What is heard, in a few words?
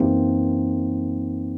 CHORD, Drum & Bass, 150 BPM; Ueberschall; Drum & Bass Flash Trackz